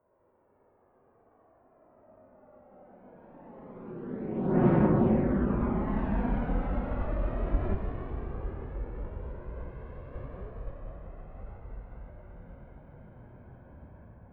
military jet fa-18 hornet turbine flyby right to left fast distant